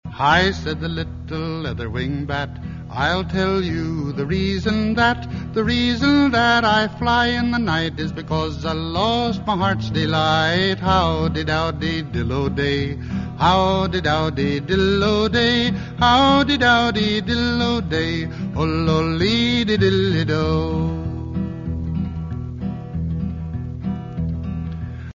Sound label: Bird Courting Song